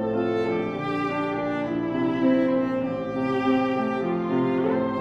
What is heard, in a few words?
cello